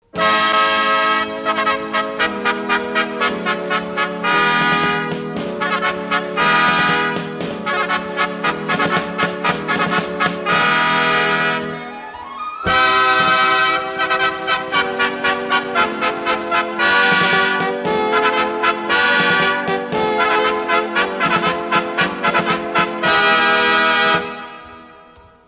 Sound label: Fanfary; muzyka k filmam; serebrjanoe revju; Silver Revu